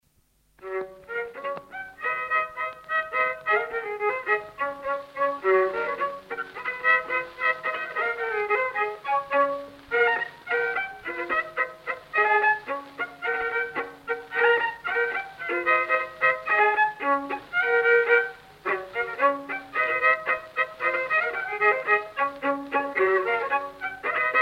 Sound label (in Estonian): Polka Tarvastu viiul folk; Keset koppelt; Johan Room